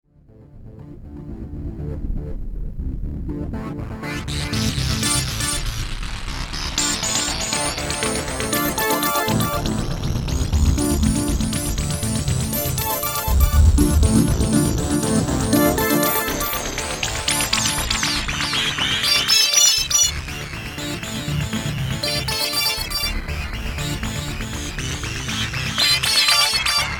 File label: Blofeld; Waldorf